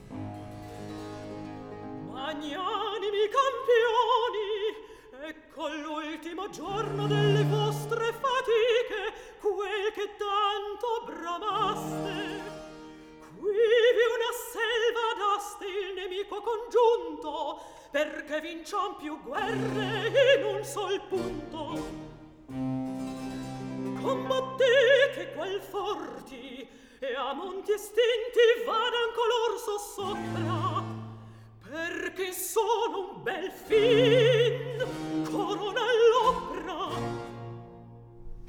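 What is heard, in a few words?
Recitativo, Handel opera; Act 3; Magnanimi campioni; Rinaldo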